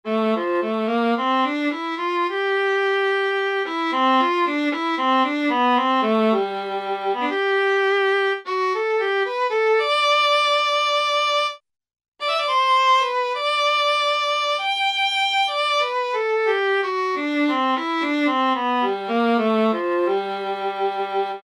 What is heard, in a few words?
STR Vla Bryt vb; Roland L-CDX-04 Strings And Orchestral Percussion